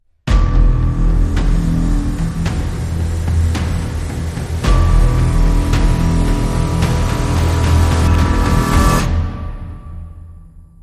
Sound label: Crime Sting